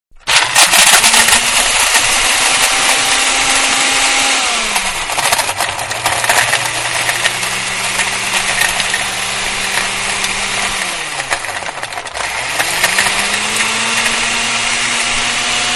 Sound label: Electric Blender Dry Ingredients; Appliances; Household; Blender Electric-Dry Ingredients; Manhattan Production Music